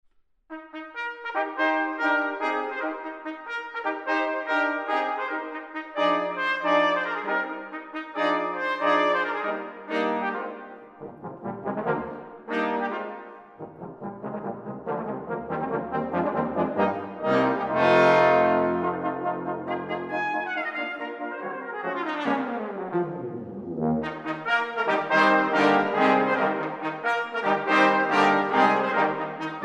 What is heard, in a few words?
Brass; 10 for Brass; Bourgeois